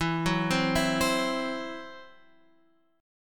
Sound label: E Suspended 2nd Sharp 5th E, down